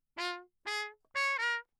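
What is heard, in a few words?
trumpet mute Bach phrase; Engemann; Perinétventiltrompete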